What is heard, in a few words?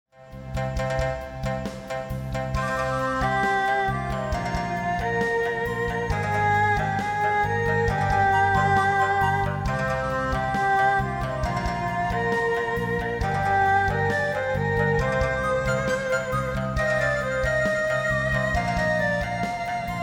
bomb planted; music kits custom